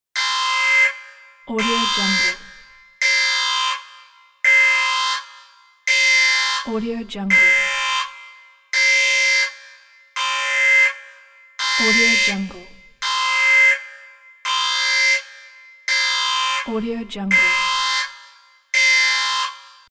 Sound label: alarm on the ship; sounddream